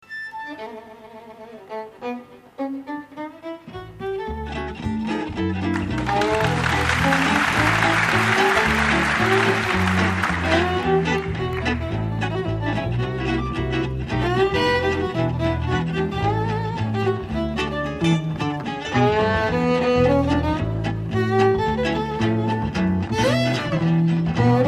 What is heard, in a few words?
Jazz